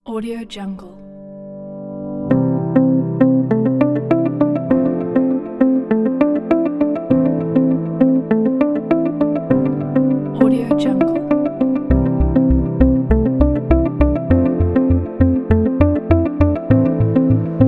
calm emotional ambient corporate

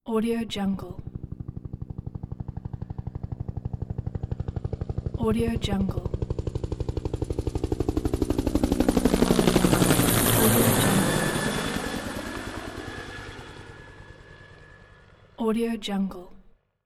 helicopter

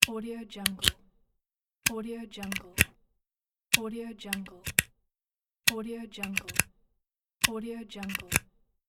cable plug

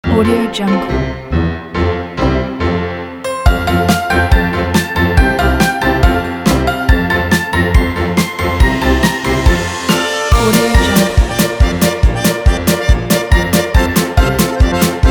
funny chasing play

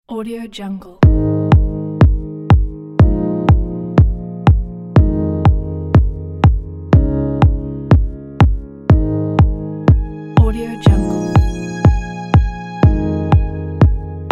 clean minimal corporate

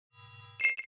ui scifi confirm swell click

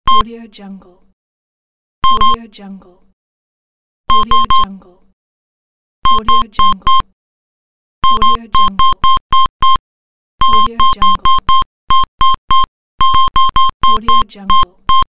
barcode scanner

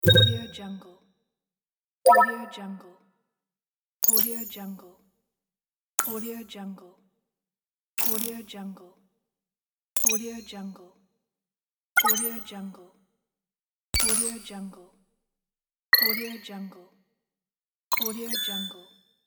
hud interface sound pack

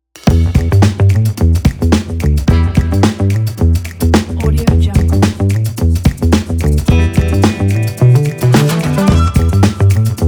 quirky jazz funk

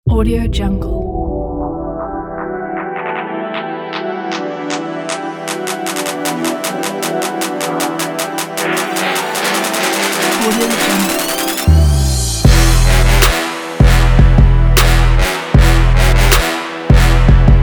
on a trap

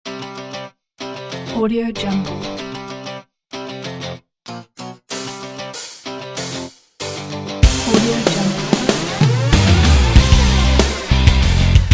drive race

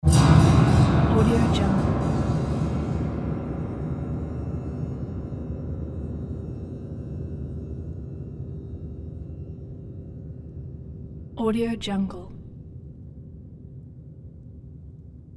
broken piano sfx

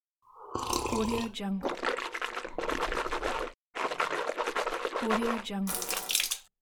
mouthwash and spit

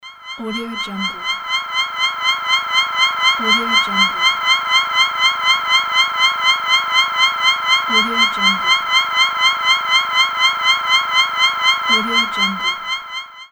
ufo

